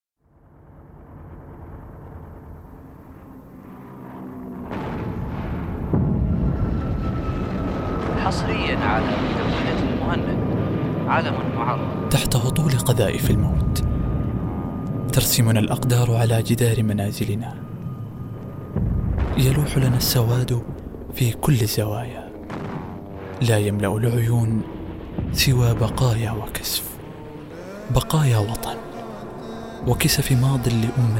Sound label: my toy sound effects